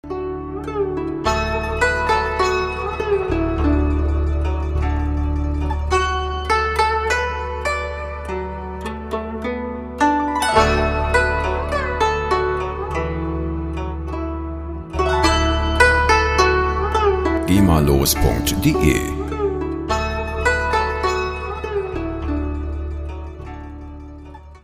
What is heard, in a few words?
guzheng